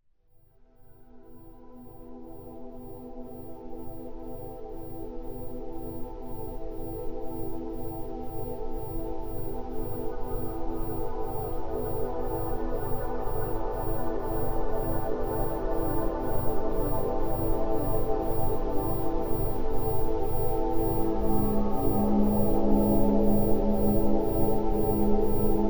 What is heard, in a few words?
super deep meditation monaural